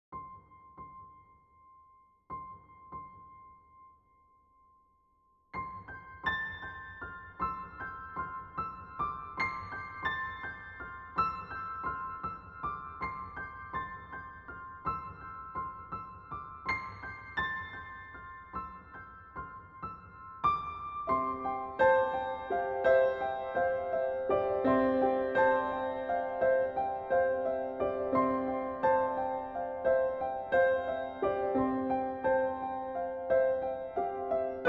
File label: Village dawn, Calm